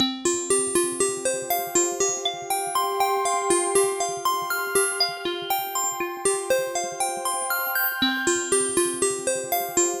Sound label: bell